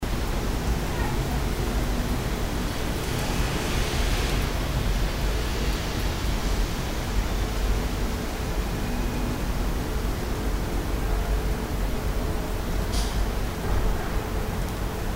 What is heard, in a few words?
Street Sounds